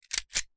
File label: hg slide